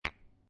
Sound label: Billiard Pool Snooker Two Pool Balls Together Softly With Rattle